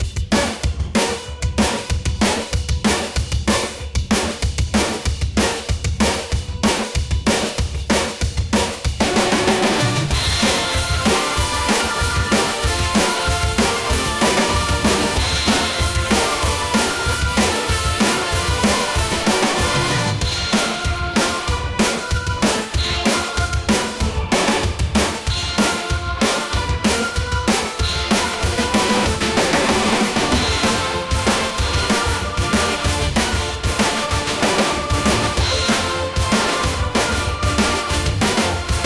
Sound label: organ epic chorale dnb agressive drums 190 bpm